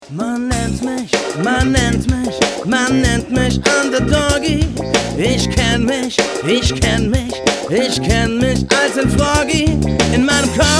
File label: Froggy Song